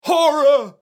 zvuki nepriiatnogo shoka u cheloveka

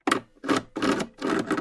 zvuki tsarapin na metalle

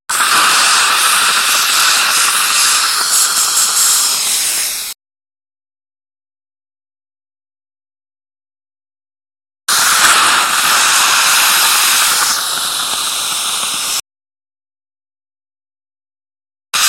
zvuki sliunootsosa

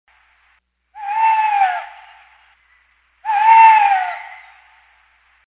zvuki sovy

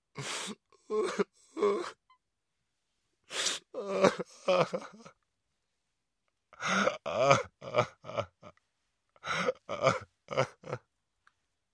zvuki muzhskogo placha

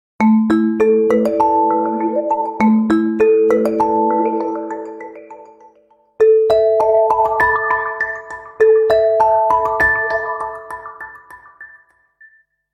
Nokia 808 originalnye ringtony i uvedomleniia

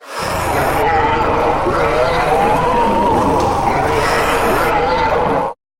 zvuki strashnykh golosov

